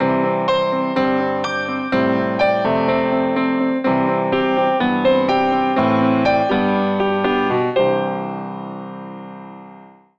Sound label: Piano Pop, ringtone